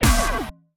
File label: Wall On